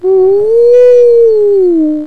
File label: uuuhuuu